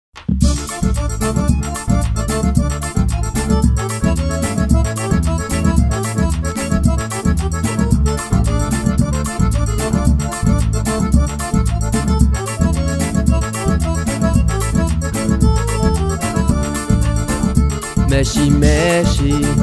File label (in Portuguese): mexe mexe